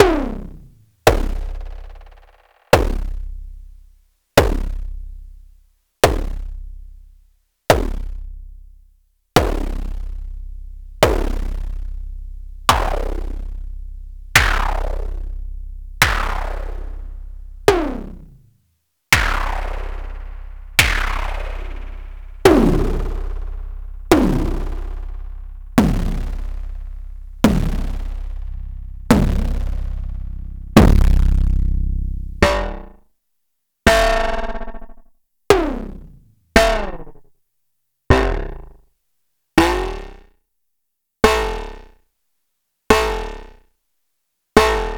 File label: Snare Distorted Feedback